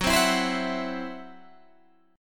C Major Flat 5th F sharp; strummed

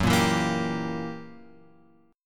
F sharp 7th Flat 9th F sharp; F#7b9 Chord {2 1 2 3 X 3} strummed

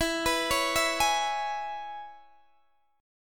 E 6th, Guitar, DADGAD

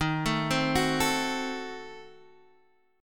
Eb Diminished 7th Eb, Guitar Half Step Down

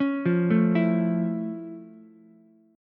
F Augmented Major 7th F Ukulele; F+M7; arpeggiated

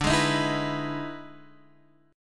E Major 7th D, E/D Major 7th chord; EM7/D Chord {X 5 6 8 5 7} strummed

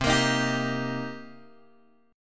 C Minor 7th, Guitar, Open G

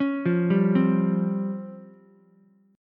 C sharp Major 7th Flat 5th, C sharp, Ukulele; arpeggiated; chord

